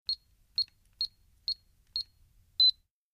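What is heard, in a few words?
Alarm Keypad Push Button; hi-tech-various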